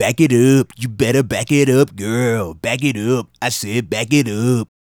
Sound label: Male Solo, Hip-Hop and RnB Vocals